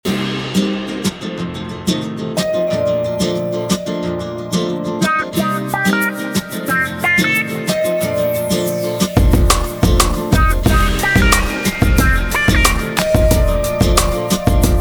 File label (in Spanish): Guitarra